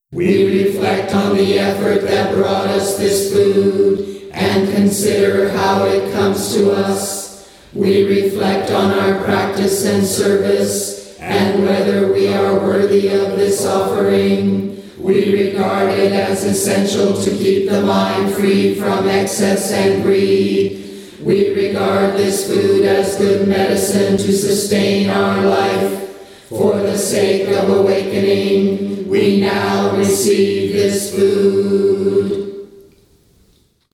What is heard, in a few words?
Meal Chant (English); Zen Chants